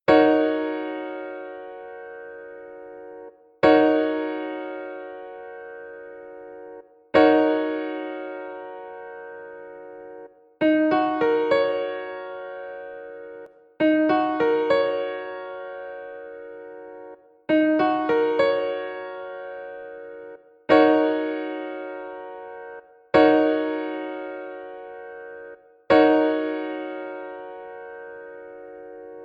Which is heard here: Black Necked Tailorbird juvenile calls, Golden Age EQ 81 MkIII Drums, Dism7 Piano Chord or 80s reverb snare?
Dism7 Piano Chord